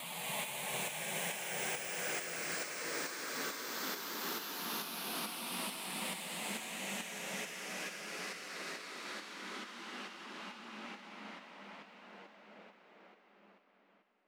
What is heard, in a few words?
137BPM Sweep Down Long; Sound FX; D minor; Vengeance Samples; Universe Kit